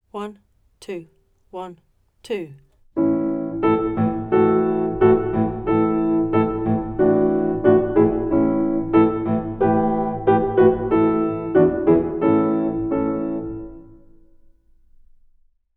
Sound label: A high backing; Mattachins